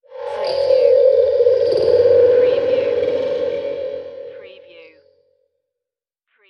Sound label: alien spiritual; sound effects bible